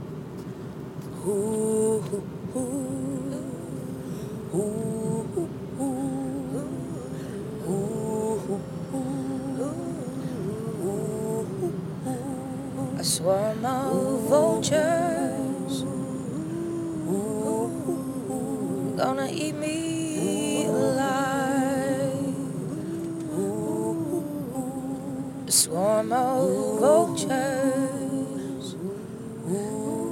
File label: Swarm of Vultures; the laramie project